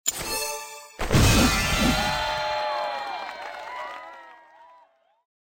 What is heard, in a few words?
settlement; greedybox